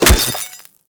Melee Staff Impact Robotic Flesh

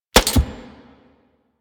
Shogun Mech C, Shotgun; Weapons; Warframe; Tenno; Alchemist Shotgun

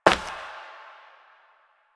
Step Glass; Warframe; Koumei

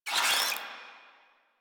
Infested Ashen Vocal Pain; Warframe

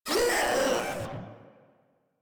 Proto Infested Runner Pain; Enemies; Vocals; Warframe

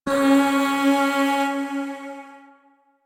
Void Shawzin Oct Two C Sharp; Warframe; Zariman

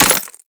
Melee Impact Glass; Warframe; Weapons

